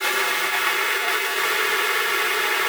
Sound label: WEQS G5, ALTOSAX; Xsample VOL-2